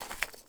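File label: ui pickup charcoal; Rust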